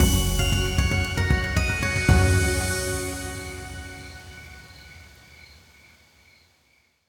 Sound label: outro Free Spin; fireflies